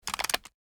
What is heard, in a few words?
put away; tacz; daffas arsenal